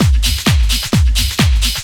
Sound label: groove, DRUMLOOPS